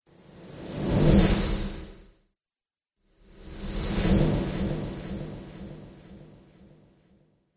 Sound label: Magic Spells; Magic Sound Effects